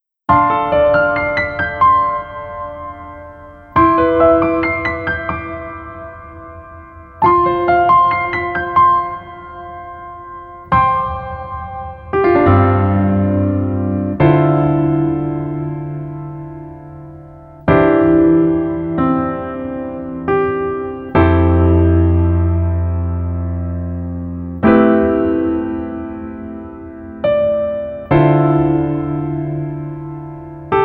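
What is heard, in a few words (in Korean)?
Piano version 여자키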